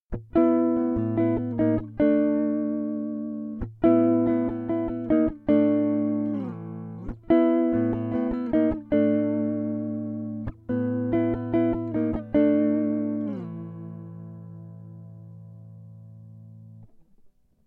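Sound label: A 140 guitar rocky riff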